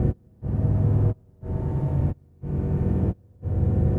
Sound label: Atmos 120, sidechained